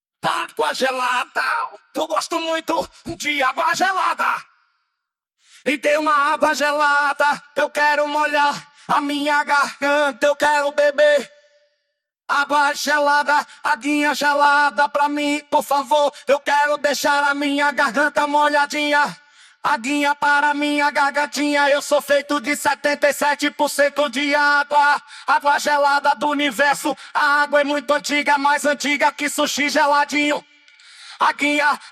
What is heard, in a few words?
Vocals, Versão Piseiro